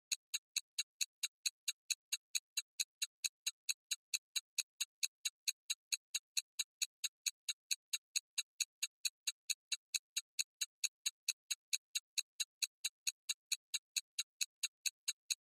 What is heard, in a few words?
Ticking Timer Type 3 Fifteen Seconds